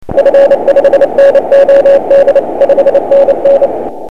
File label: cw